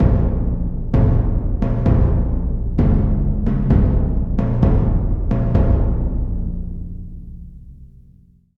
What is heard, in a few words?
Music Funeral March; SFX Kit; Foley - Misc